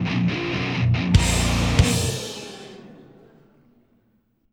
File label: Reward FAIL